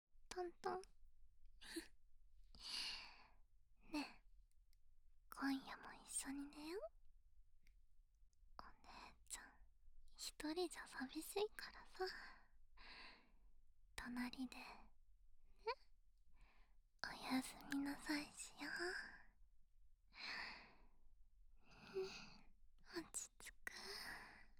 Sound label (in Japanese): ねっとり耳舐め添い寝 左耳; 左右反転バージョン